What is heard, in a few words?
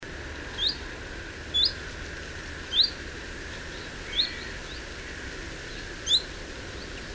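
Phylloscopus collybita, Chiffchaff call; Espoo, Uusimaa, Finland 22 September 2002; Linnut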